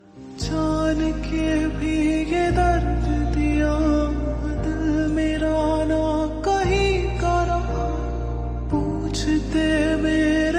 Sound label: Slowed Reverb